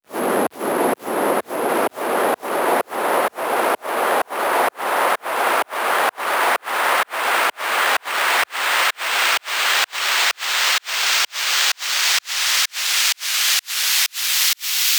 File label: FX Uplifter